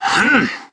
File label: effort; tf